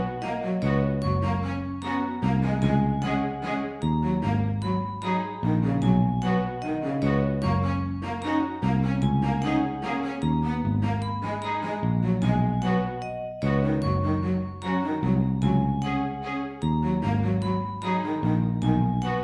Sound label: loop